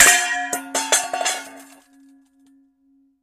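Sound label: Bowl Large Drop On Cement; barrels buckets & cans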